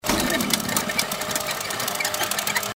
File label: spin; Fluffy Arcade: Doubleup Ducks LuckyTap; Eyecon